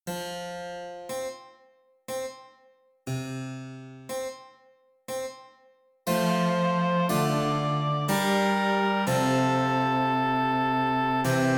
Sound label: Continuo